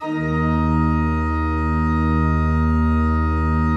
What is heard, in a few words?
D1, Cathedral Organ; ILIO; Propeller Islands Cathedral Organ